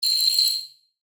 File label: Alien Bird Chirp, Scifi, Alien, Bird, Chirp, Science, Fiction; BlastWave